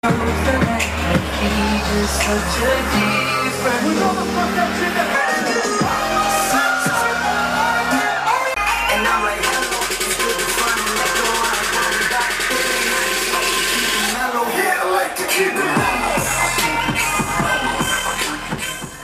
trance; tomorrowland

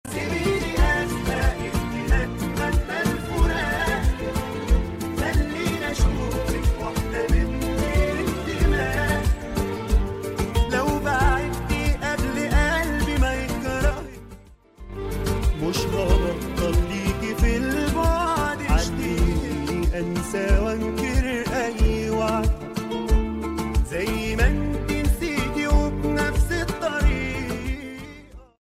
arabic

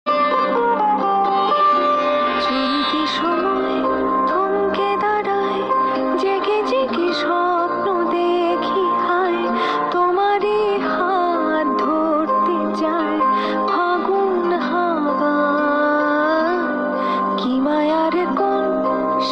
romantic bangla

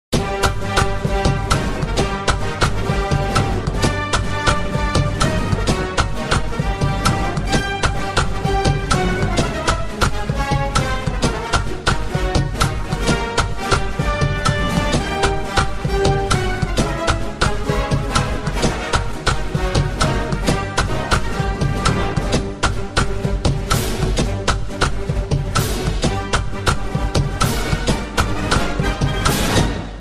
funk

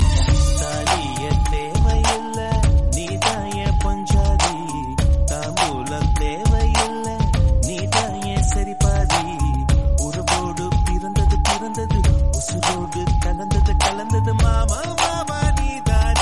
tamil remix

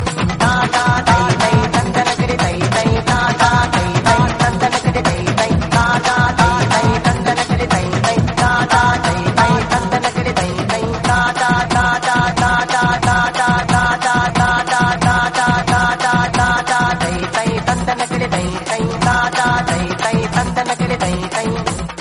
tamil remix tone

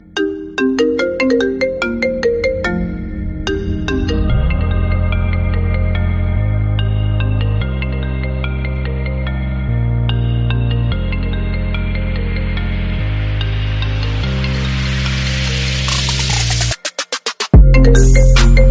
trap; iphones

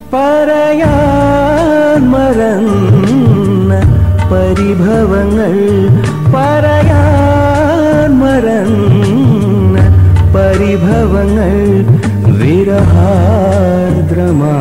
malayalam gazal

